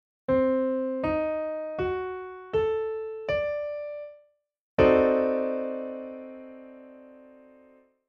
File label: chord pf dim7